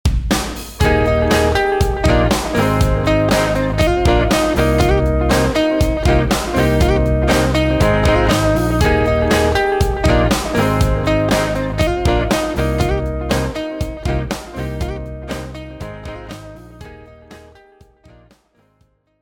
DIPlus; Dean Markley

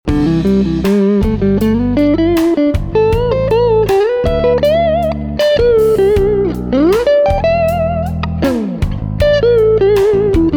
Elliott Tone Master Orange CR60 Clean Spring

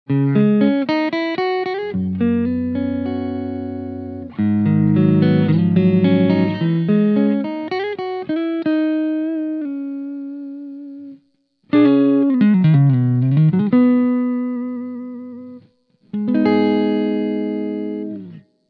Fender Road Worn Strat Boss GT100 Clean Direct; Pro Tools Direct

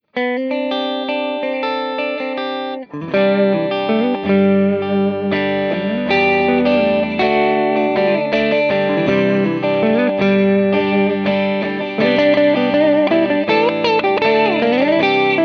TinyTerror Clean Les Pauls; TinyTerror TT15C-12; WCR

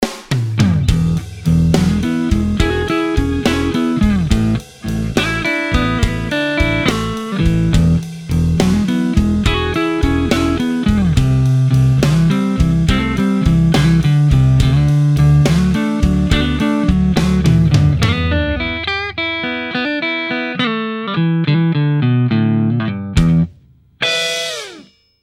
Clean Jensen Falcon

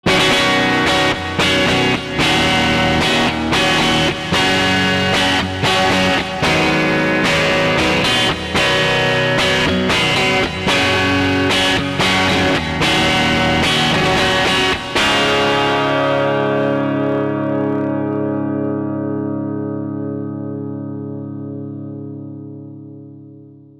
DigiRack Extra Long Delay Modulated; Tech 21 Liverpool

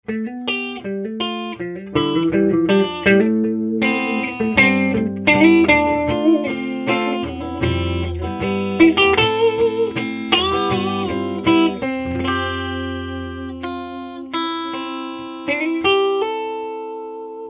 Fender 59 Bassman Reissue

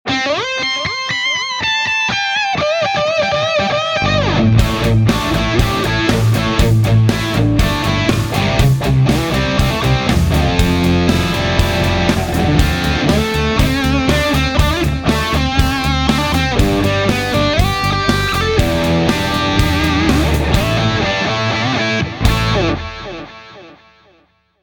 Satched Celestion G12-50GL Lynchback

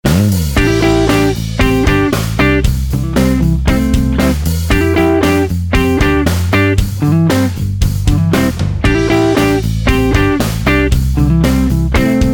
Gibson Les Paul Orange CS50 PPC212OB

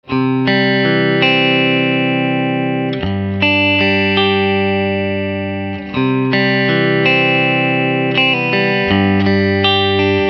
Fuchs Tripledrive Supreme

